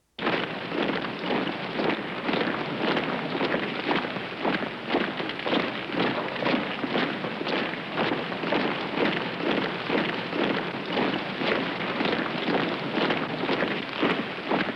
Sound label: Army Marching